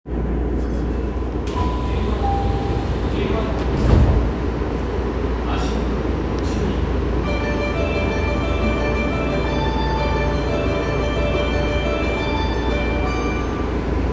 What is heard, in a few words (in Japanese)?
副都心線平和台北